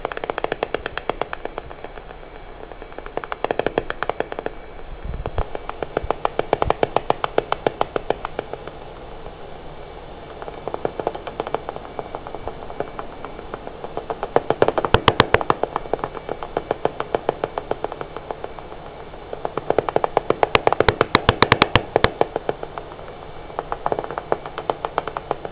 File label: myotis myotis; fauna; sismisi